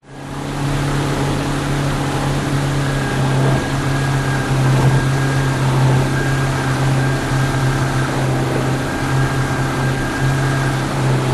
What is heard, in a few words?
transport motorboat outboard 16 ft fiberglass drive fast sea slightly choppy; near motor